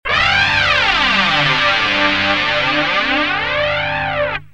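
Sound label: guitar dod r870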